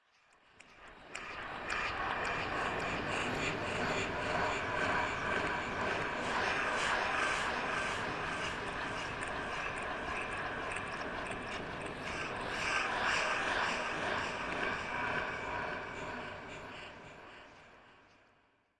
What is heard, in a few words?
NOISE; Caja de papel